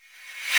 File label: hh reverse, Roland MC-909